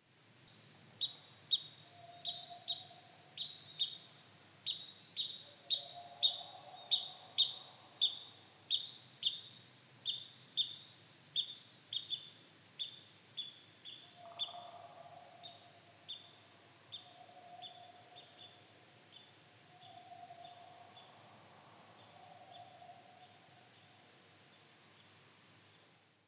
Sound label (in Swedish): Större korsnäbb